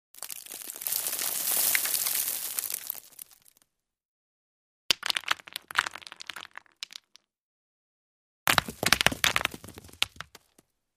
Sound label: small stones